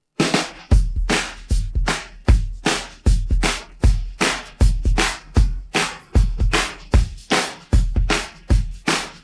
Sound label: drums pattern